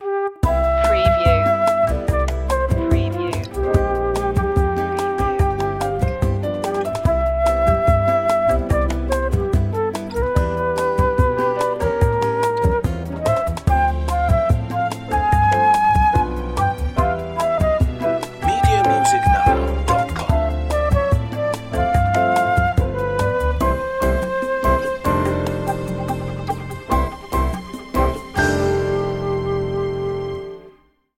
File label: love bossa